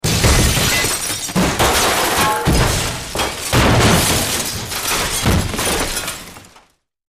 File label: Large Impacts, metal & glass